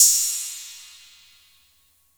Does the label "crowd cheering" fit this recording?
No